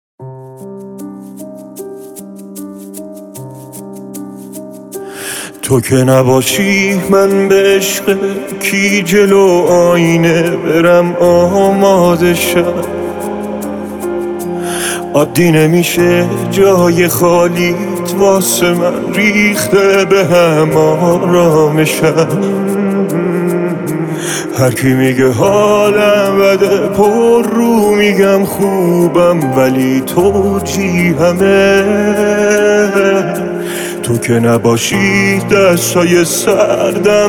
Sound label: Guitar Version